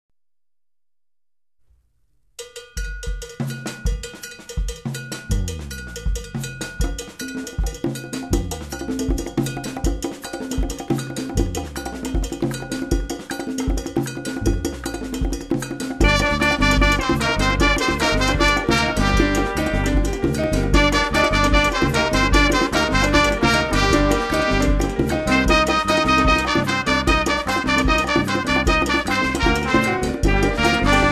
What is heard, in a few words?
conga